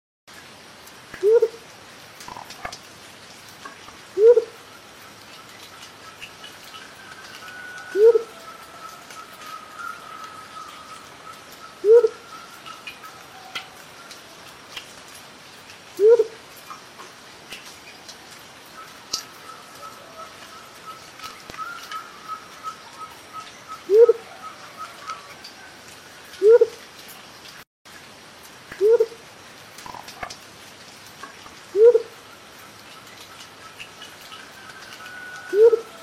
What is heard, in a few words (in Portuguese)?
Canto do udu de coroa azul